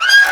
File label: claw scrape; npc